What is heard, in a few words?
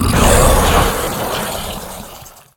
Servant Of Yogg Saron Death